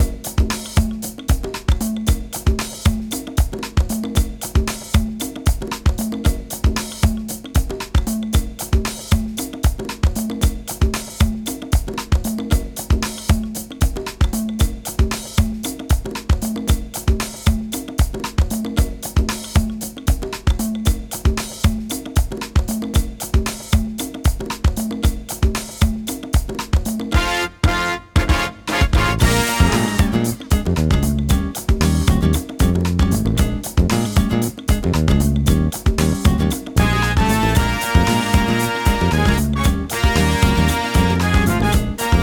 Funky Easy; 리듬BG

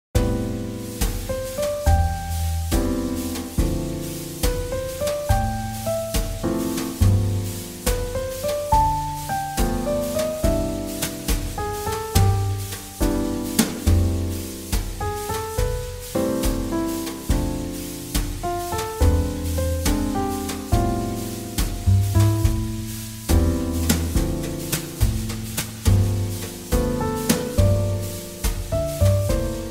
JazzBallad; 잔잔

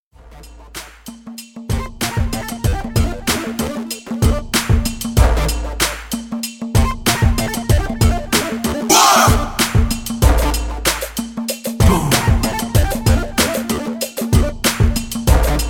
Hip-hop

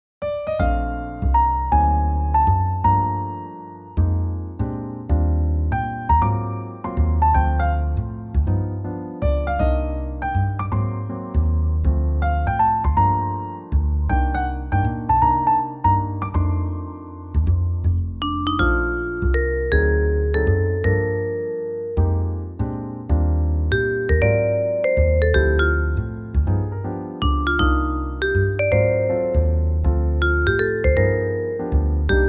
Swing Jazz

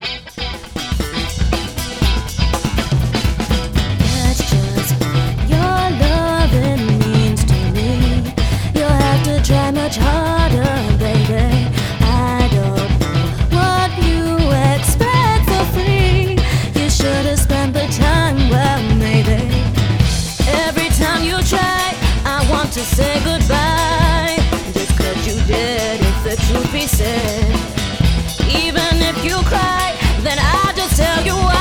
Funk; 쏭 음악; Funk 4

